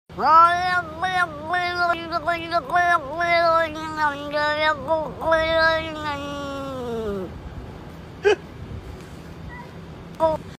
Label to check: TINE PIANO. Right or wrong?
Wrong